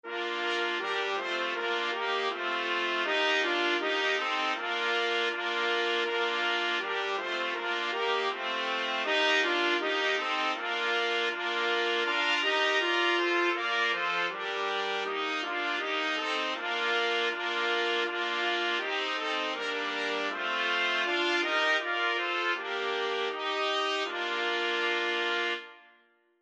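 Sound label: 4 trumpets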